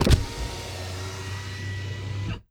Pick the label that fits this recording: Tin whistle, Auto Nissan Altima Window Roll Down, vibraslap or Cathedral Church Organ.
Auto Nissan Altima Window Roll Down